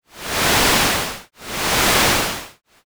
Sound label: shoreline